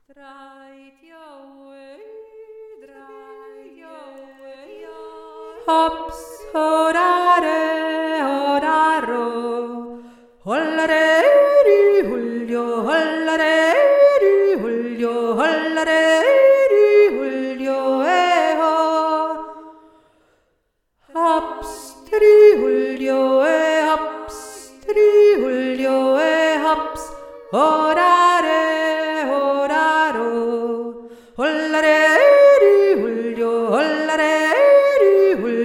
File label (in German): dritte Stimme, jodeln